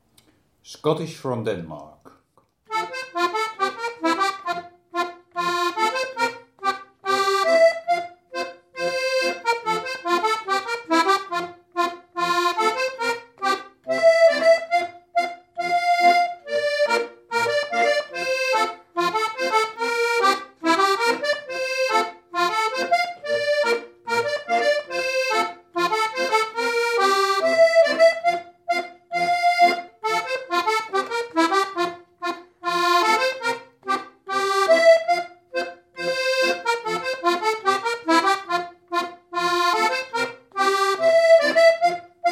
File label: schottische from denmark